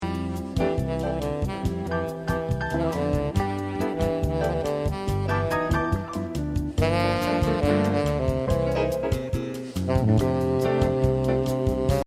Jazz Saxophonist; Albuquerque

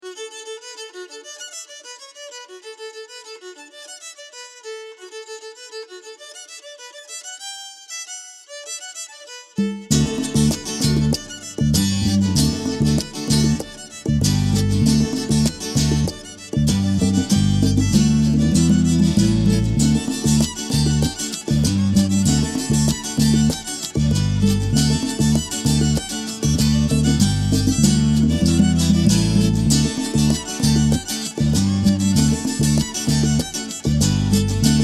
Irish Band; Washington DC

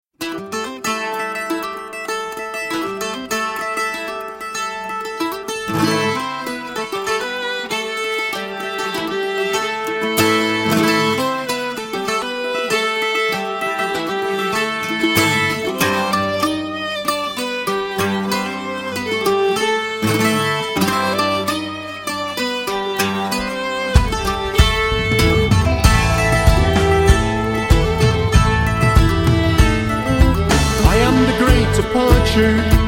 Celtic Band

